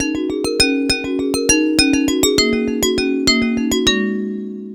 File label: Fast Glass; Ambient; Melodic; Ambient-Melodics